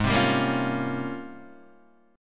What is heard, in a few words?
G sharp Major 13th, Guitar, DADGAD